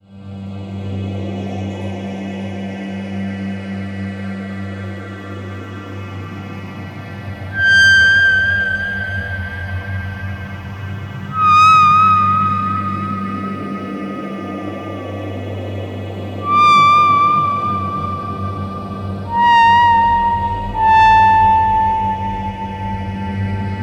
pro1, synthesizers

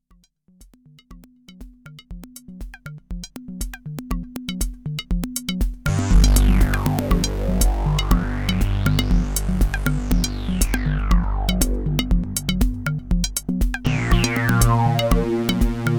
Waldorf Rocket Dual Mix

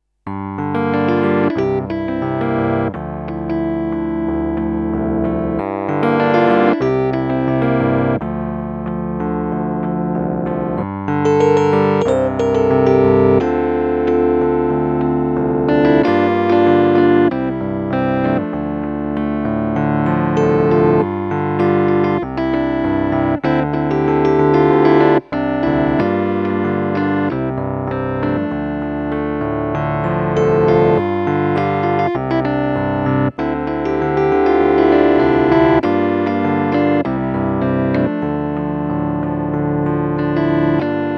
Hydrasynth Rhodes Piano

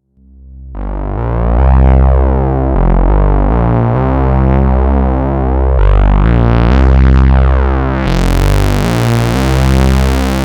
MiniBrute Super Saw Bass

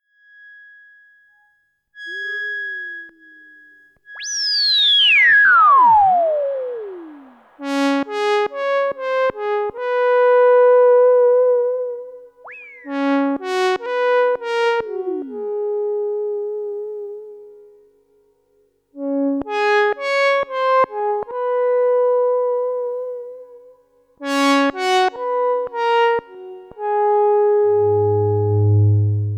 Soft Solo, synthesizer; GRP A4

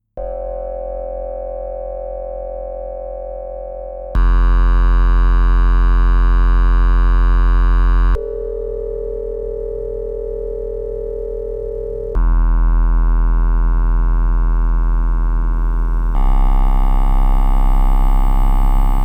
Sequential Pro3

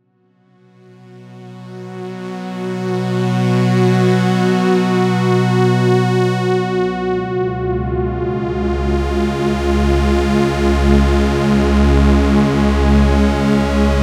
Roland Jupiter6 Strings

